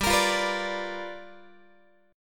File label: G 7th Sharp 9th G chord, Guitar; G7#9 strummed; x,15,13,15,15,13